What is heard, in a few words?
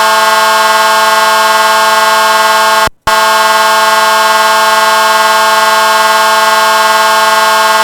HORN HORN, siren